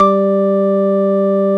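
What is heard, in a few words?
organ G2; Best Service; Advanced Media Trax Modern Composer; DANCE ORG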